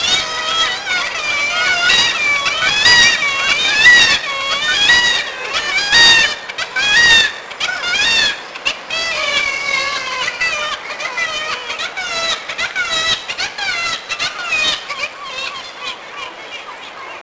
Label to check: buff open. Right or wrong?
Wrong